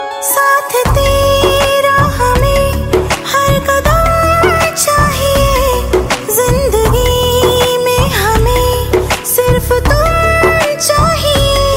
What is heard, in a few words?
hindi ringtone